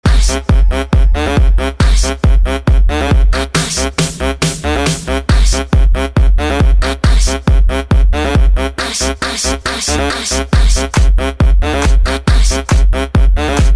Club Sound Disco

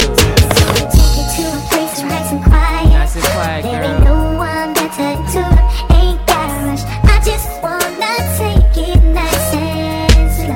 Nice Slow

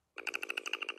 Chatter; Perry

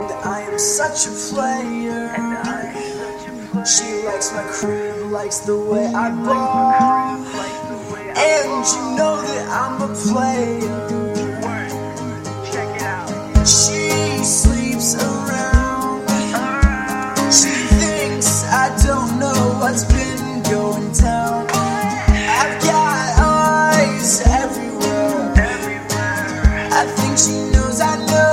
Hahahaha; Player

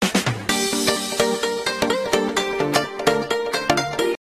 Arabic Music

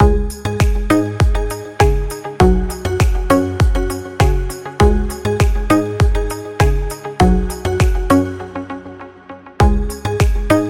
upbeat ringtone

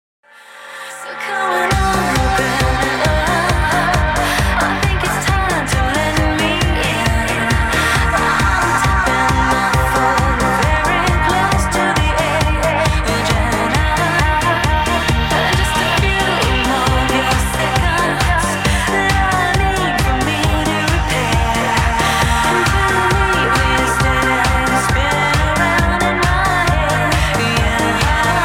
Trance

